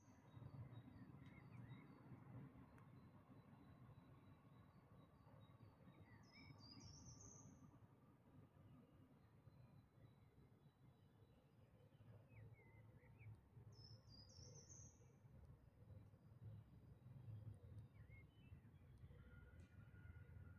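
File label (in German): Dawn Chorus, Vogelgesänge vor Sonnenaufgang; der dawn chorus 2026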